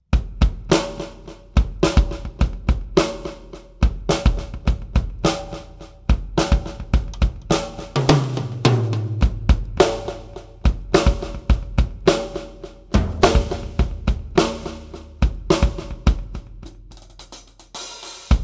YAMAHA EAD10 8th Note

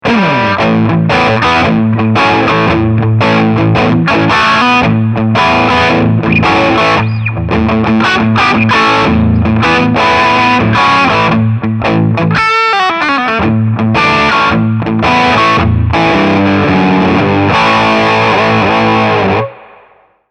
Crunch Channel

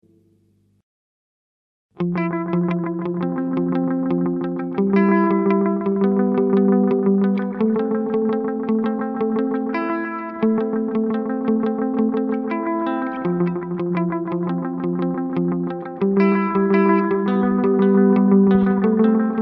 Seymour Duncan Vapor Trail Slapback

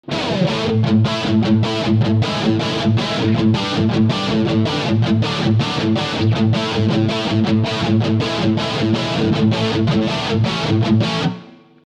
Hard Rock

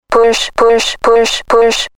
Hercules DJ Sample

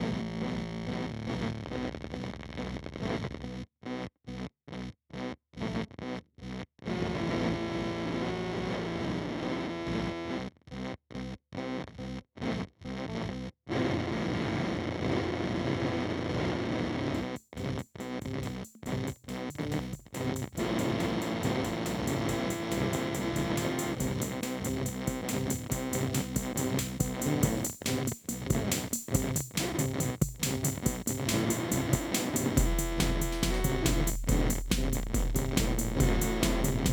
Pigtronix Space Rip

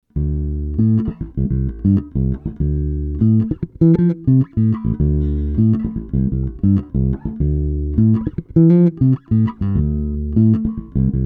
spector pasiv pj

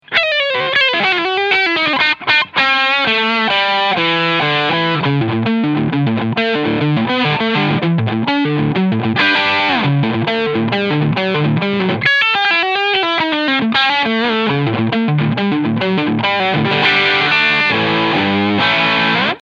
Keeley Noble Screamer Overdrive Tone Drive; Schalter links unten rechts oben; Tone 11; Drive 3 Uhr

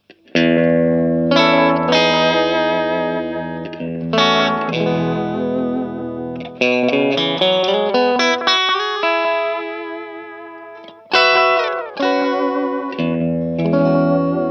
slapback

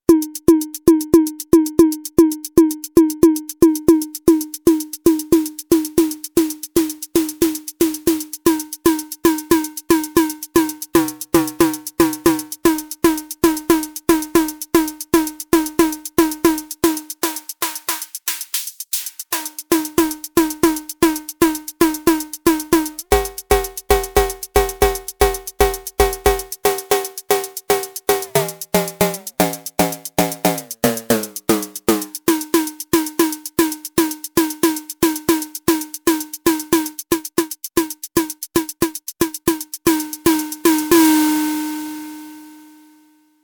Neuron Snares; Audio Damage

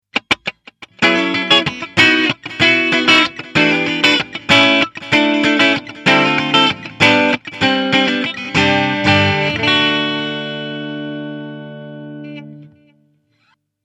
Clean Bright

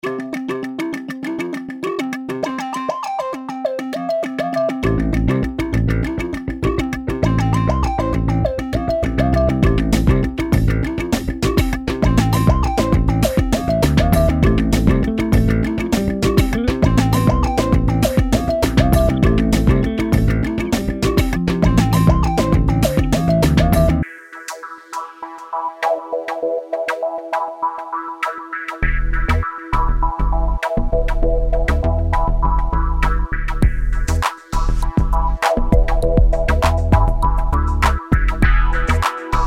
Bass Drums

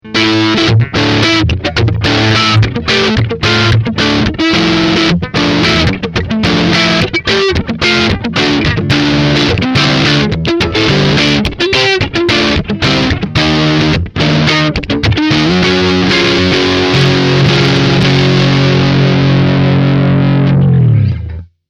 Neck Heavy Slashchords

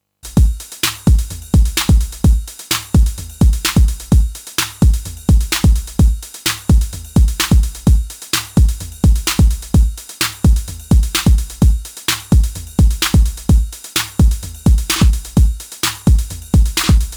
TR8 MX1 Eletro Scatter Verb Step Delay

